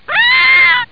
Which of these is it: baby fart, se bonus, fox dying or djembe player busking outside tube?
fox dying